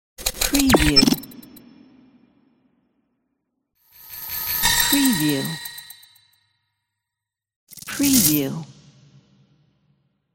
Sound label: glitch transition intro